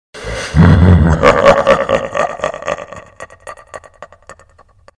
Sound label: laugh